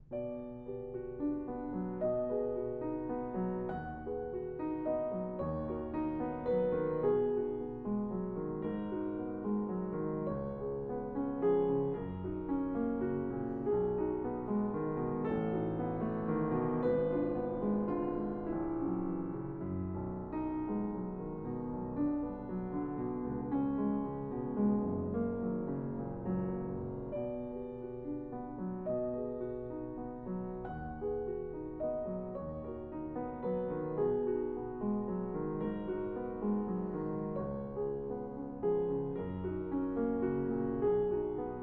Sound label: Ballade